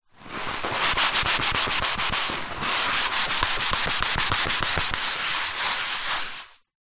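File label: household paper towel tissue kitchen roll rub clean wood surface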